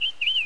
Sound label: parrot maximize